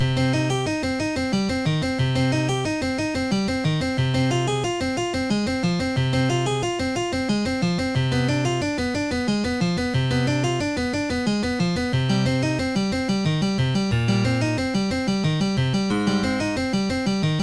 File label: prelude; OctaMED MED